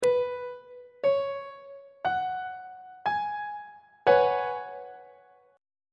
c sharp dominant 7th suspended 4th 3rd inversion on treble clef